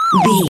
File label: retro collect pickup item